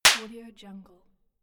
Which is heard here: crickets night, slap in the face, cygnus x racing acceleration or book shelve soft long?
slap in the face